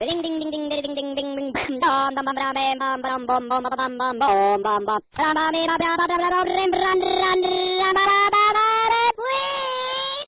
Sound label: ringer; Ringtones